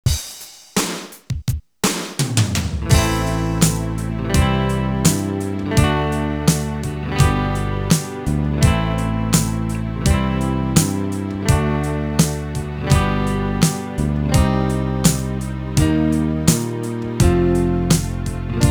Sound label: backing track